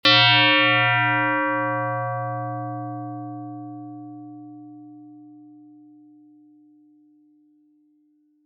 chowning Bell; FSound

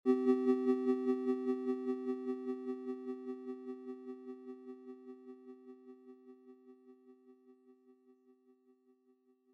pingpong; FSound

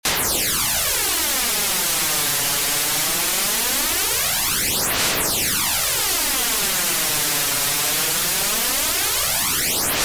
noise Flanger; FSound

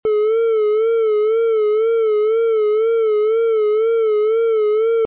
triangle Vibrato; FSound

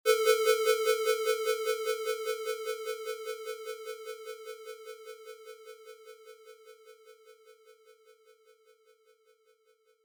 saw Chorus Adsr Delay; FSound

